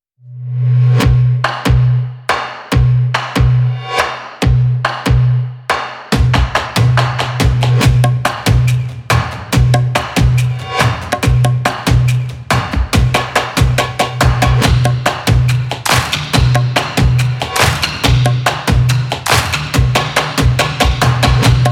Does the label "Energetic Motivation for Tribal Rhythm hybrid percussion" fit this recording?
Yes